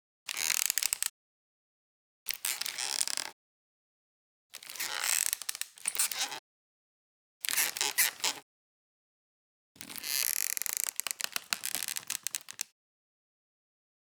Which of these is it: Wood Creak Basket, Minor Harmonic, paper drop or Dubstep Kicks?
Wood Creak Basket